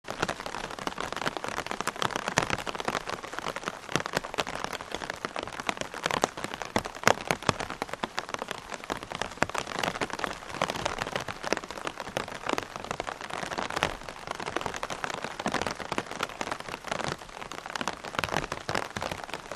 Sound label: Light rain on umbrella underneath perspective loop